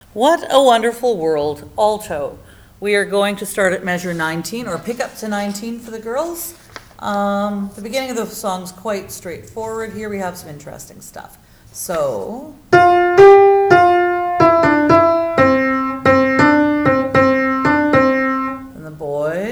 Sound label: alto